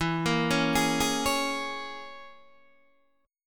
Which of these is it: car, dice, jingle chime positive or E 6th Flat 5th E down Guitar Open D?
E 6th Flat 5th E down Guitar Open D